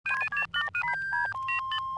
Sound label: hail